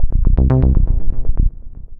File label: Movement Syn Bass; Synth Bass; Ableton; Factory Packs; Singularities